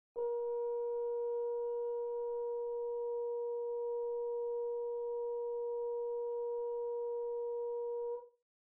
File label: Brass Trombone